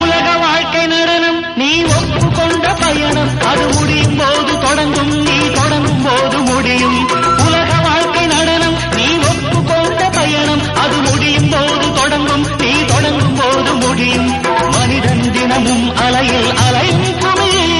Tamil Ringtone; Salangai Oli

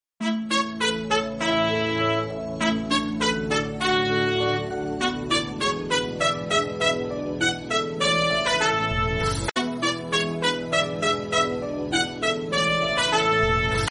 jingle bells

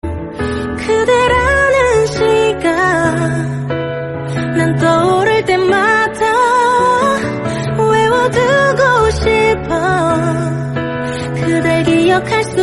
Korean Song; Hotel Del Luna

